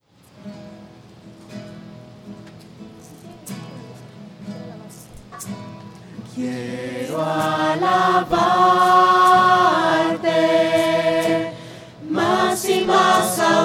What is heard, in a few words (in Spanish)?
voces; misa